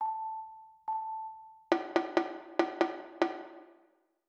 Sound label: rhythm simple 1 beat